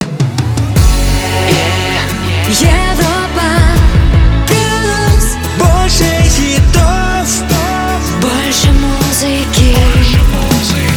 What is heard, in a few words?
Jingle; Europa Plus 2022